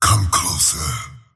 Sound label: attack pain; slyrak; dota